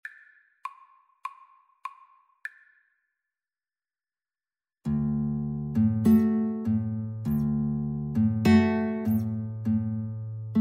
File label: Guitar Duet